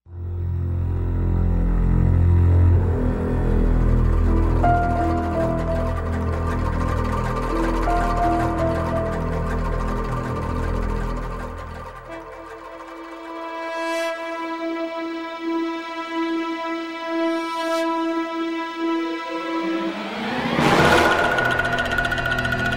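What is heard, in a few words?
criminal music without words for background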